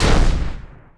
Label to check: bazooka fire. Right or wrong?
Right